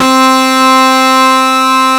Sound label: WAILING, DIRE LIKE; East West - Global Sounds